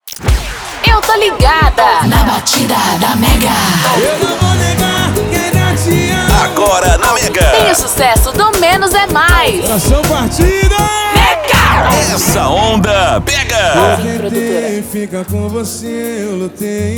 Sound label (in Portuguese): INTRO MUSIC; MEGA FM